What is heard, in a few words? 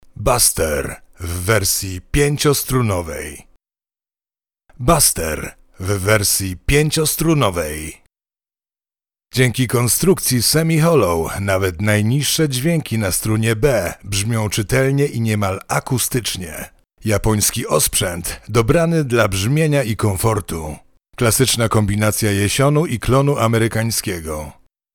BASS